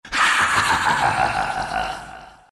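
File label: laugh, Chinese; Dota 2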